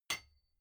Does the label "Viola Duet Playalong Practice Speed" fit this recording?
No